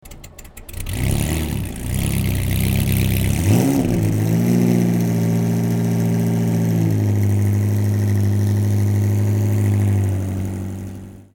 Bugatti T35B

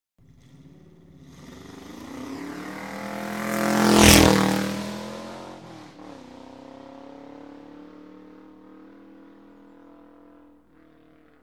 white open car, engine

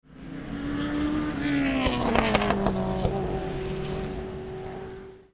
1969 Chevron B16

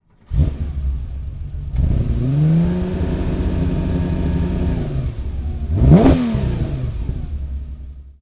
Porsche 911 RS start

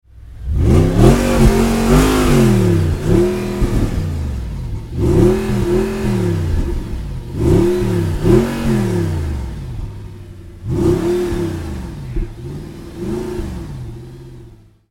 Audi R10, engine

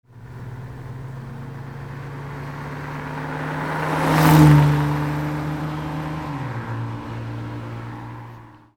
Ferrari 400i

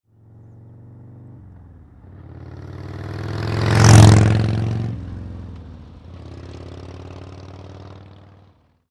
Harley drive by

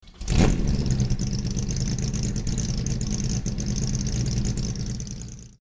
Jaguar XKE

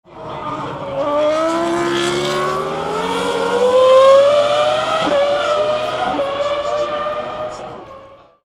F1 Ferrari, engine

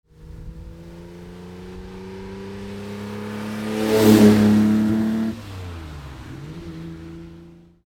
ferrari 330 gtc

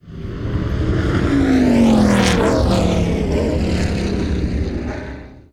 McLaren M6B decel

